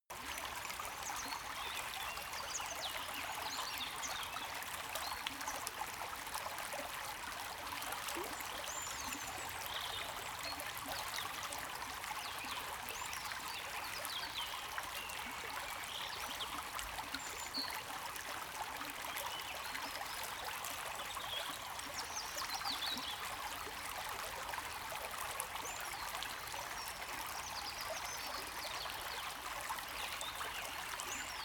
river small calm birds loop

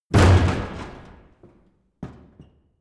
crash large metal box

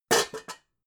pots stack hard

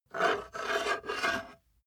metal scrape on wood

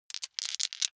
big three rattle in hand short

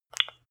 landline click

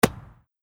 football catching

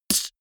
beatbox snare

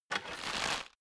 bow load

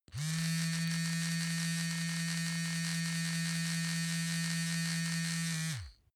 razor smooth strained

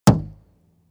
plastic canister hit hard

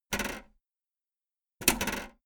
video tape recorder flap clatter

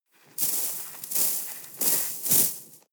snow trickle hard

